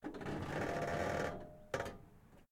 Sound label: Elevator Creak; Puddle; puddle plus ios gamerip 2018